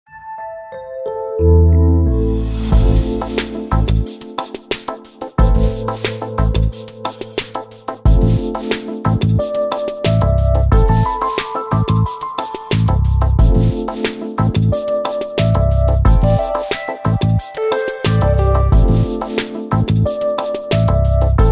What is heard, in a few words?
dreamy piano